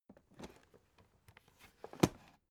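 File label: aluminium case small handle close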